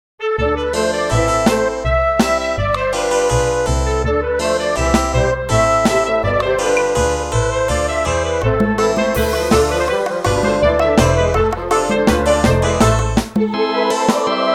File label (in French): Farandole